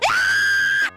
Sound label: koro scream; korone